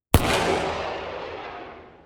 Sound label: gun tails; soundmorph